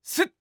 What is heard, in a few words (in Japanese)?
攻撃